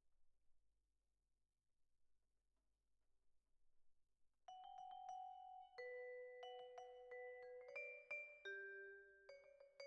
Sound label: Vibra, Twin F